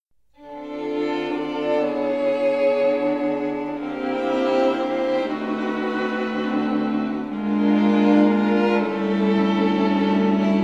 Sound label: jazz orchestra